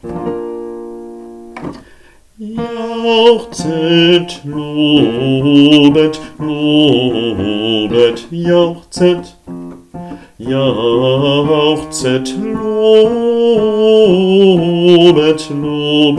Jauchzet Bass

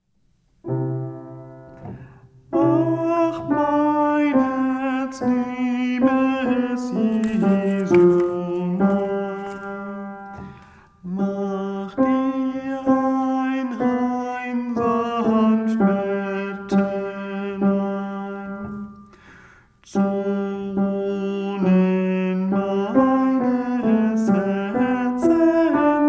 Ach mein Tenor

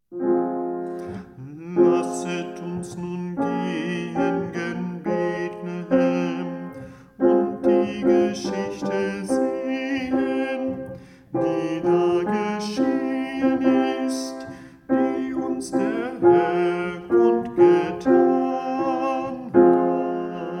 Lasset uns Bass

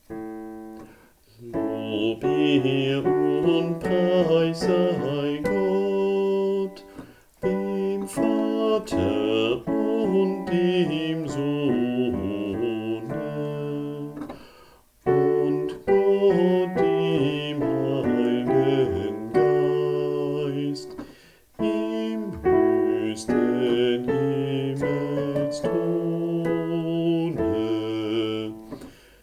Bass, Kirchgemeinde; Lob, Ehr und Preis

